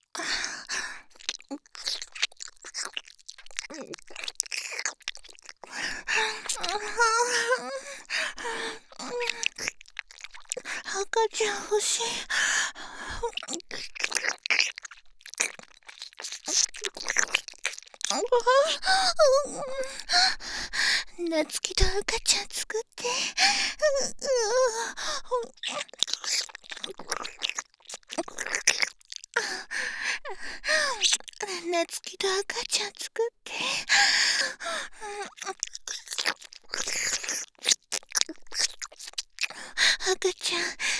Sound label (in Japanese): 耳舐め喘ぎ; 赤ちゃん作ろ♪; ハーレムオナサポ 4人のメイドがご主人様のオナニーをお手伝いするバイノーラル～文、千曜、名月、莉桜～